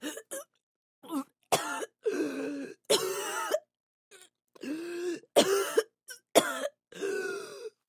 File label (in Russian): jenshina podav